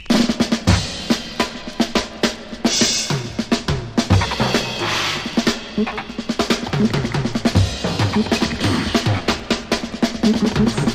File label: psychedelic percussion